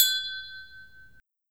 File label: knocking glass - originally sampled sound